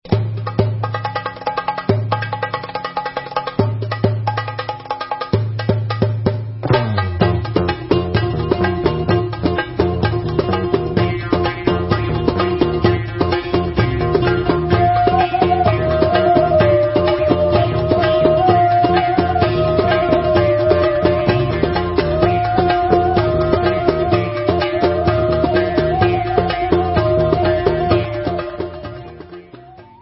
Debka Instrumental

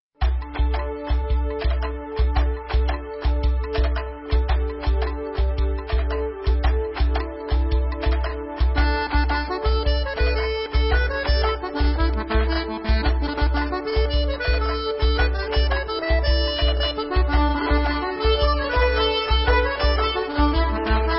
Irish Dance, Irish

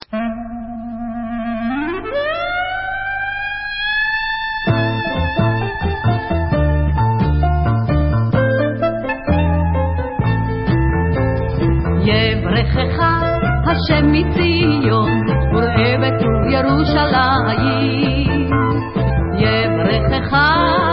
Vocal

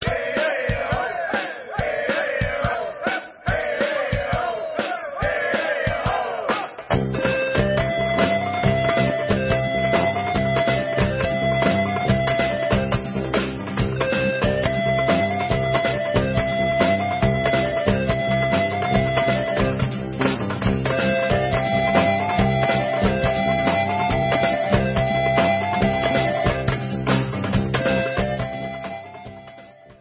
Debka

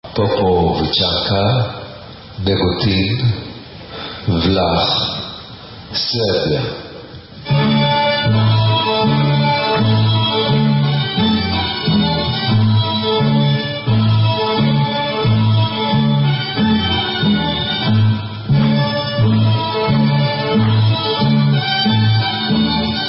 Serbian Instrumental; Popovicanka

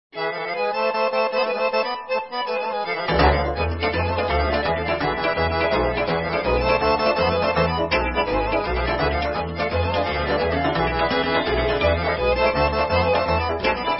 Pravo Horo, Bulgarian

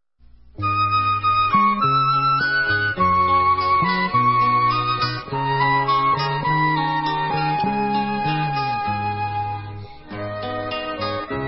Instrumental

